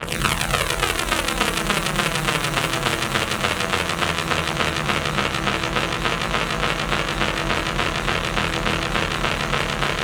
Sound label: mad radio, special FX twisted